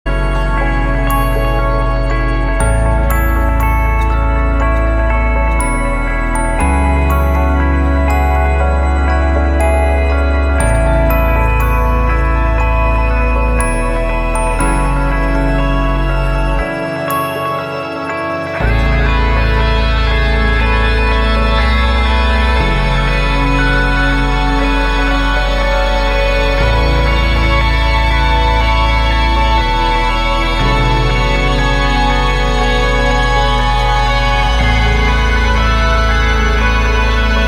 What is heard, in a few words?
ambient